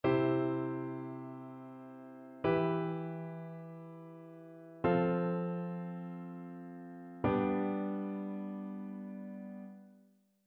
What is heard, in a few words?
harmonic dictation; aural skills i